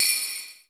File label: bell; 90 sSampleCDs; Crypton - DrumBase5000; LA KITS